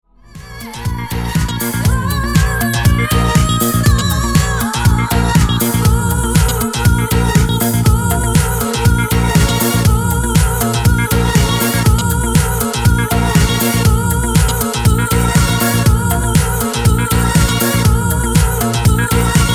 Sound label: EDM; zero-g; ethera